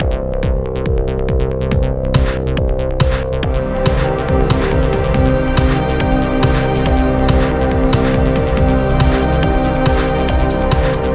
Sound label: A Lightning Fast Trance